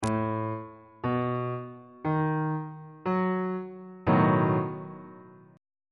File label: b dominant 7th 3rd inversion